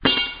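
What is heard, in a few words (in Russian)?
звон металлического предмета брошенного на пол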